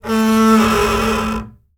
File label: INSTRU STRING Double Bass, Screech, Scrape, Harsh, Short; Articulated Sounds; Special Double Bass Fx